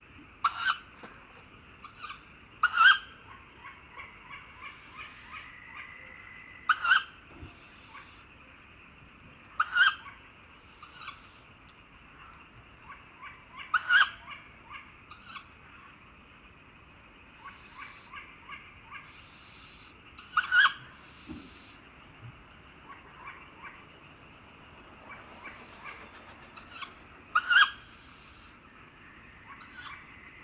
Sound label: Tawny Owl Begging Calls Of Fledged Brood With Guarding Calls Of Female